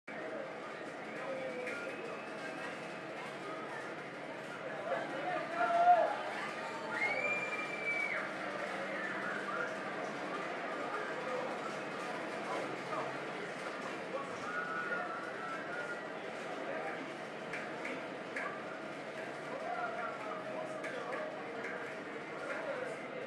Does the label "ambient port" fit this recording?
Yes